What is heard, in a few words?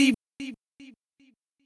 voice